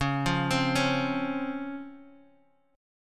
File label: C sharp Major 7th C sharp, Guitar Drop D; x,4,3,5,2,x